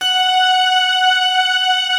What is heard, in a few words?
HARPS, Harpsiflute, Harpsi Stack; Optical Media International; Sonic Images Library